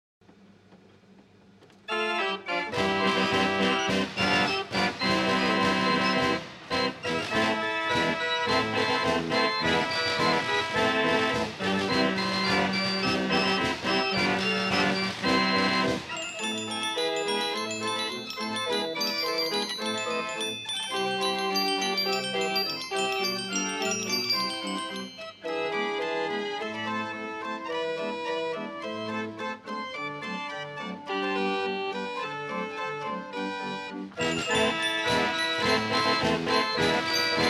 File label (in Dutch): draaiorgel; ridderings 35er voigt